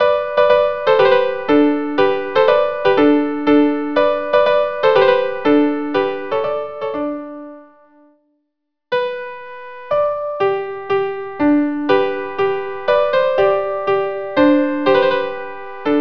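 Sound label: viola viola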